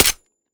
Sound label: mech, glock; Urban-Coalition; Decay; arccw ud